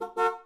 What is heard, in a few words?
car horn